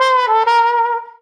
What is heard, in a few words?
Trumpet Riff; Levels Hub Bayou; Music Riverboat